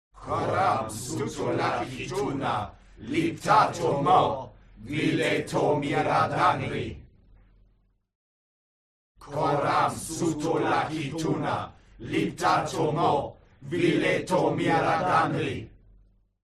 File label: HUMAN CROWD Evoke Normal; smartsound